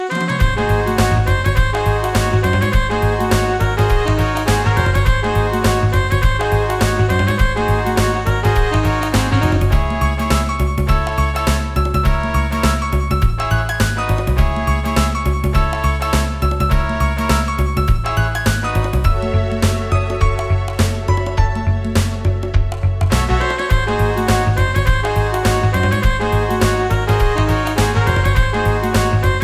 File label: Swing; donkey kong country 2 fan remastered